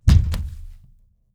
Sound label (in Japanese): taore